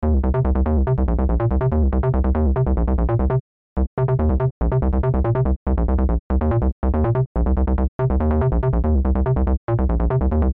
arpeggio

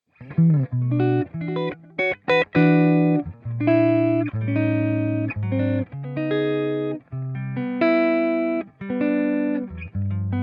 335 Clean Jazzy

